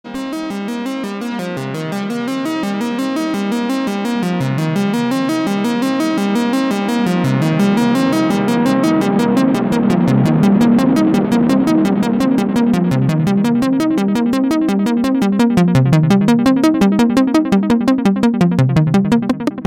synthesizer sounds; alternative

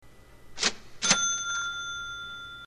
cha ching; ringtones

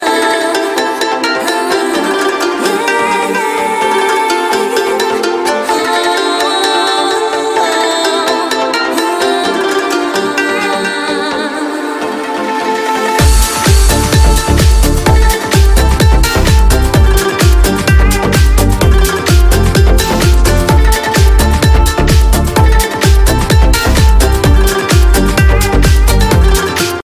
musix Beats

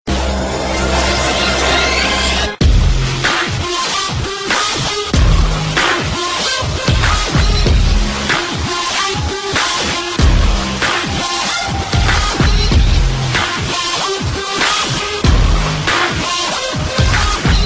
best android, ringtones sms

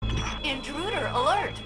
intruder

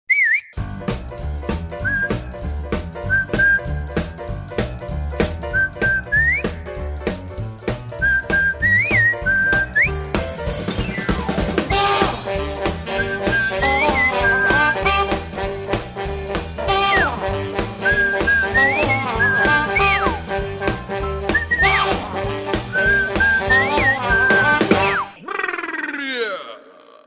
cartoon whistle; ringtones

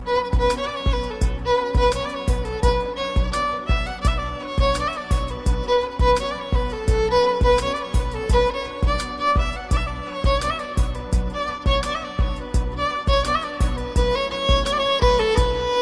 magic violin

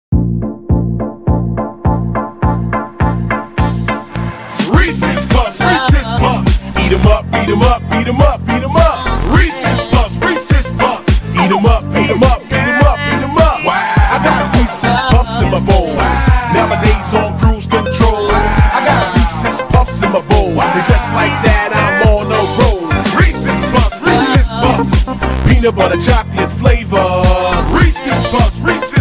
Rap

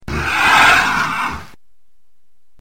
Classic Doom Imp

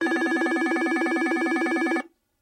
nortel vista ring

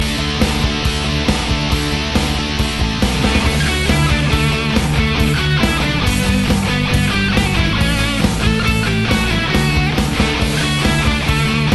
metal xmas; ringtones

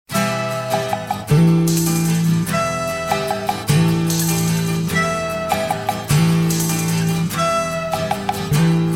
Wooden Beat

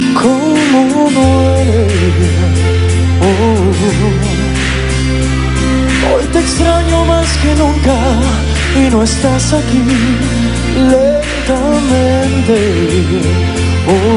Is Calling Ska, ringtones

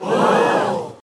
Crowd Gasping; SSBB

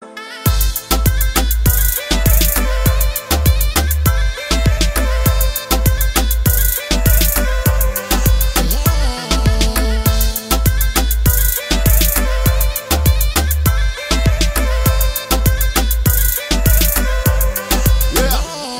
Beat

